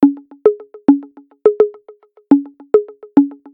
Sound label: conga